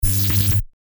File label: Short electrifying outburst electrical noise; sound design effects